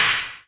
KIT, Dance Mega Drums; Best Service

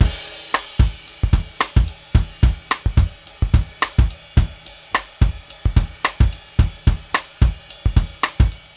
CRASH RIDE; Sampleheads; New York City Drumworks VOL-1

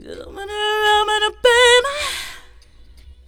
FEM, Hip Hop

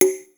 DR-660 DNC, DRUMS PERC

